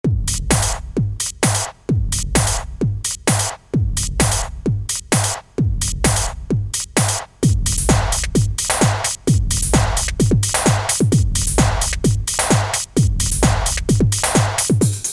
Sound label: Urgency Beat, DNB